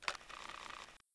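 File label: crossbow reload string long start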